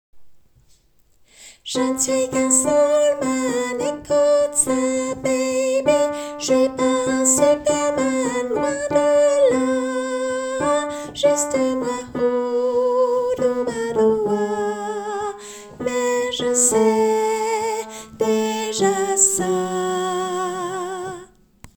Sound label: tenor